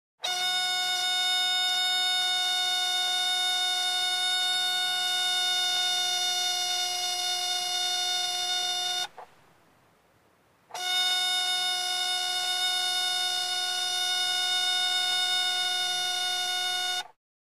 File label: Door Buzzer Economy; door o/c